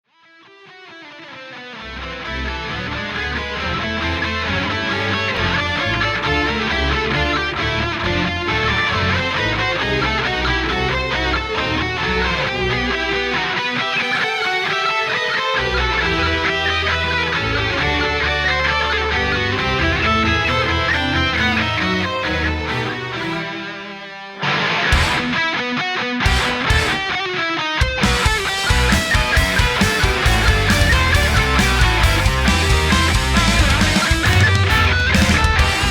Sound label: Rock Cover; Super Mario RPG